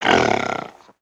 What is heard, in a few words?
rock throw; creatures organic